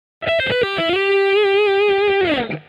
maj7 arpeggio pull offs; fretjam

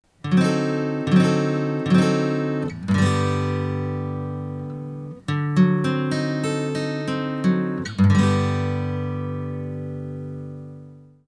flat 9th dominant; fretjam

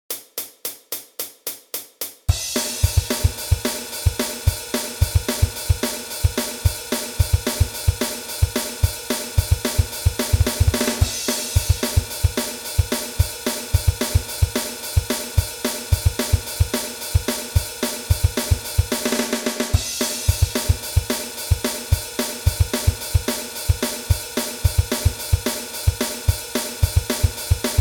metal strum; fretjam

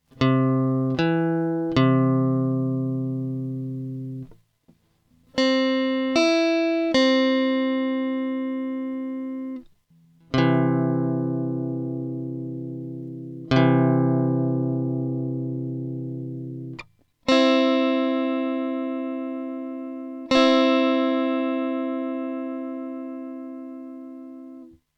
major 3rd interval; fretjam

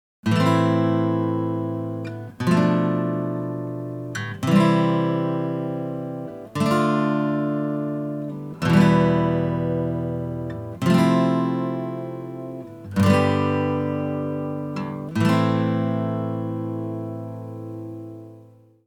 am scale; fretjam

